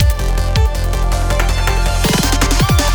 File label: Snow Transition; sky rogue